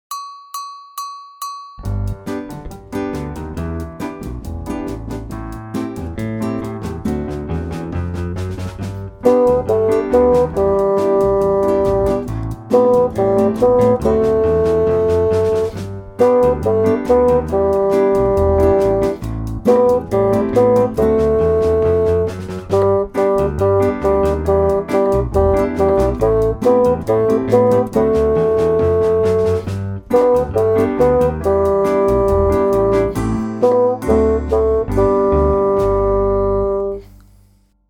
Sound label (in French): basson